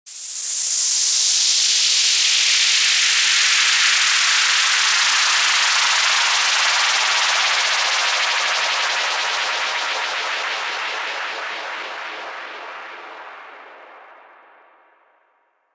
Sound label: LONG WHOOSH